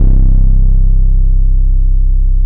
BASS -R, Drum & Bass, 160 BPM; Ueberschall; Drum & Bass Resonance

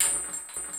SY77 BULB, drum; Crypton - DrumBase5000; SY77 DRUMS

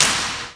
slap shot, sports; AKAI S6000 CD-ROM - Volume 1

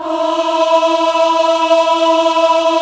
AAH, VOCAL

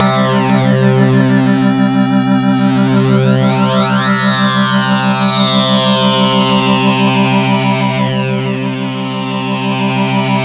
C3, SynthLine; 90 s Sample CDs; NiceBeats; SynthLine VOL-9

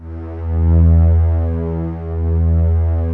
E2 SLO LO, KEYBOARD; AKAI S6000 CD-ROM - Volume 1; Piano

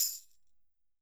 tamb, PERCUSSION; Roland MV8800

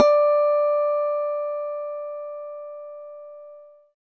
guitar, e-guitars; Sound & Vision; Gigapack I CD 2; GUI E-Guitar 3

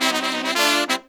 132, Pop & Funk Brass

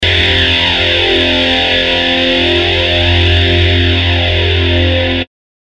Power Drone, Guitar

